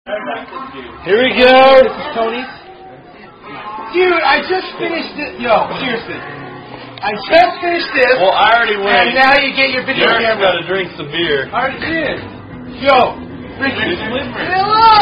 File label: Drinking Game; Quarters